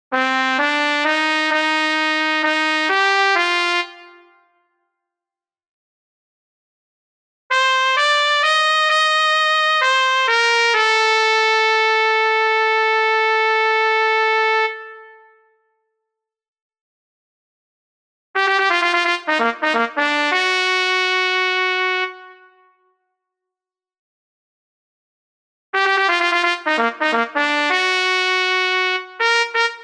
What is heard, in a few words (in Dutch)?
Trompet in Bb